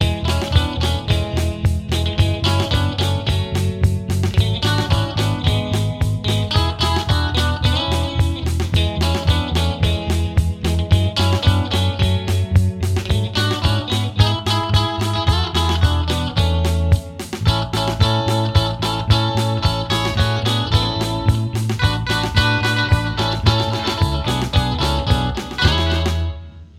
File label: Jingle Bells Royale